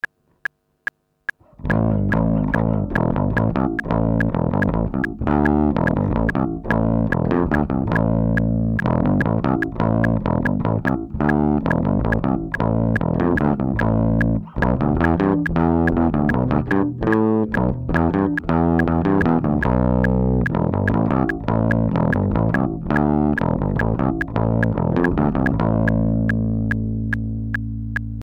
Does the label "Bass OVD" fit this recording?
Yes